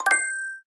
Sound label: Google Glass Success